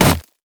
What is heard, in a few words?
red mech melee basic attack; minions; LoL